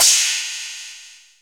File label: elec crash, Electronic